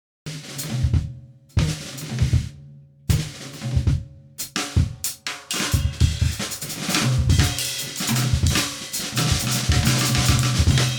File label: Freejazz 30HZ BOOST 4 60Hz ATTEN 6